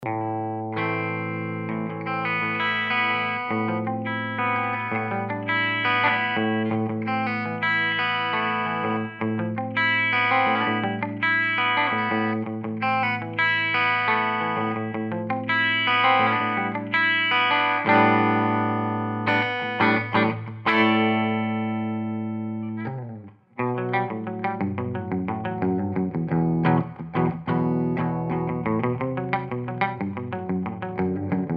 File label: Chisel Bridge Clean